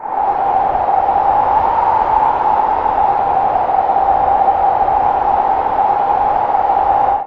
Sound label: WIND; Best Service - XXL Production Effects; WIND+STRM